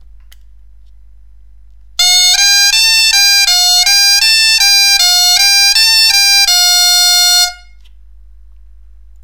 gamme mi fa sol, bombarde

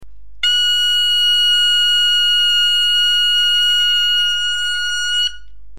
fa octave, bombarde

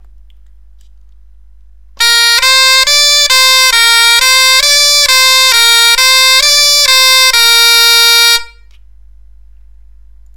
gamme si do ré; Si/g Do Ré Do Si/g

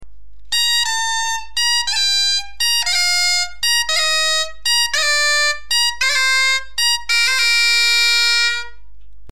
descente arpege ornementation, bombarde

